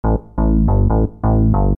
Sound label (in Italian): basso